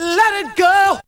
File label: VOC